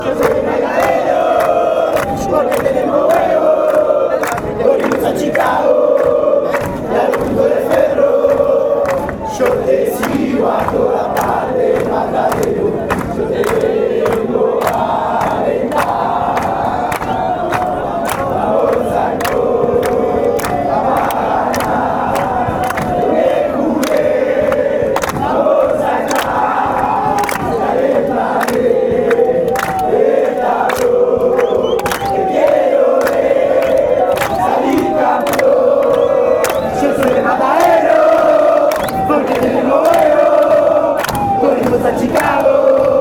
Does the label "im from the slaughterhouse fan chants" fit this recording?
Yes